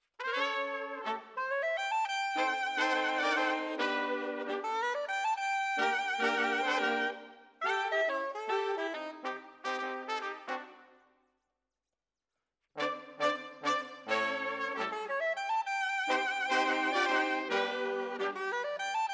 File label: Brass